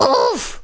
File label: OOFF; 1997 MMX LAN PACK (win 95); Worms Armageddon; Danish-Pyrus